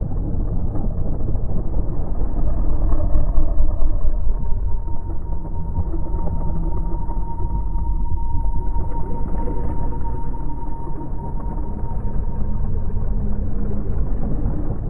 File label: sounds music; Halo Combat Evolved; Xbox